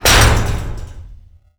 punch catwalk

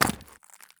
sprint glass; Escape from Tarkov

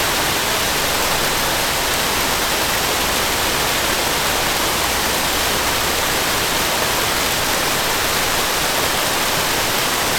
ambient; World of Tanks

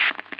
radio click

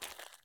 walk asphalt; Escape from Tarkov

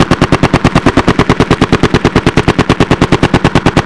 Inf Weapons; Red Orchestra 41-45

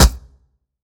Aircrafts Engines Packard Merlin Prop Ex Sm Pop; DCS

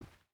footstep boots cloth walk; Rust

